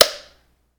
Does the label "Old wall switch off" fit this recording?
Yes